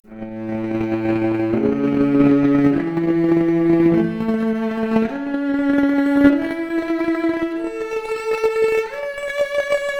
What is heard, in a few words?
Violoncello arp, mp Stereo output noised, 3bit